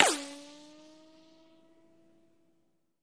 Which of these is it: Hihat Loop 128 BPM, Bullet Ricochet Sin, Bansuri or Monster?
Bullet Ricochet Sin